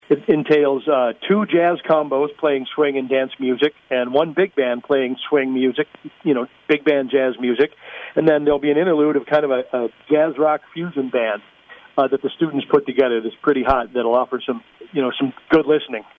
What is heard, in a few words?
Jazz Swing Party